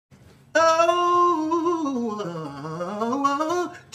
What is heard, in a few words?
bounce Oh oo